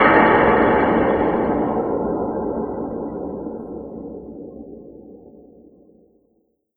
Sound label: sci fi explosion